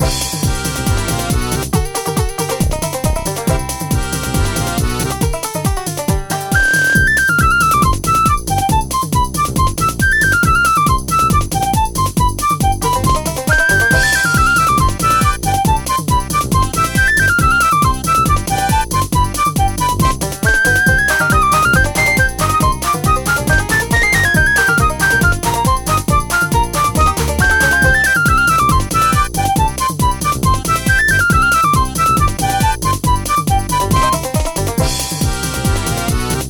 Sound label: Polka; Dwarf Polka; fairytower